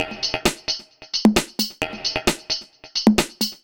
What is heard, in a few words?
No Kick Loop, Dance Beats